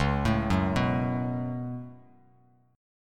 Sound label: Db 7th Suspended 4th Sharp 5th Db down Bass Standard; JGuitar